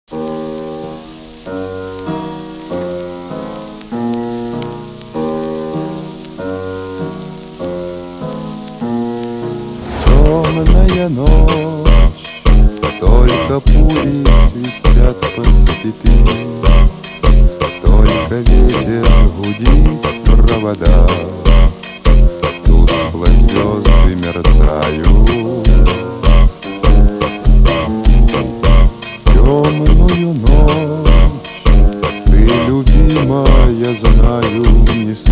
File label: funk